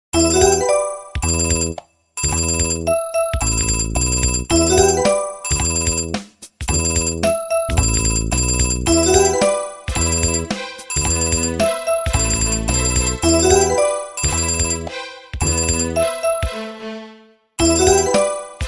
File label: Nokia Alarm